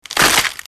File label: shark bite